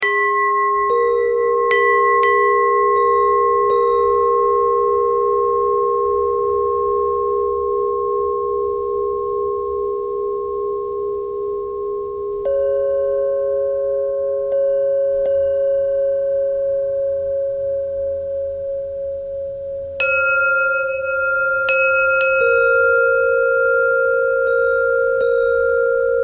bell buzz

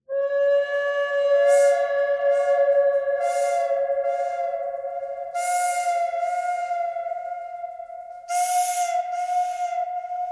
bird canyon bird thread noise; birds

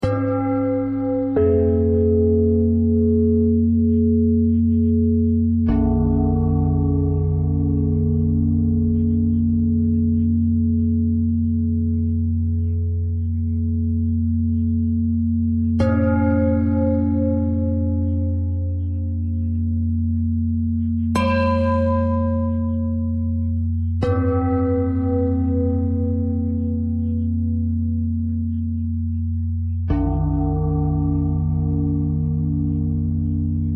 bell bend threads

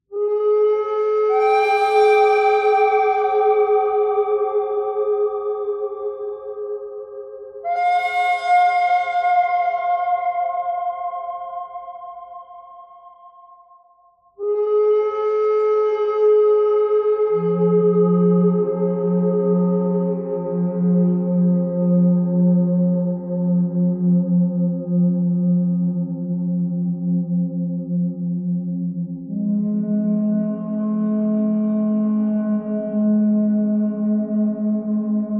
bird thread buzz

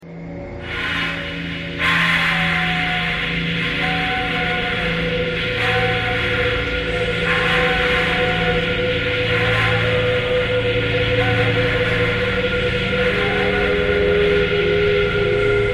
thread pentatonic

